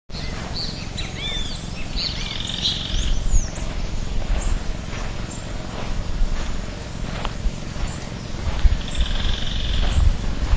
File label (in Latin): Certhiaxis cinnamomeus; Itaparica; Jorge La Grotteria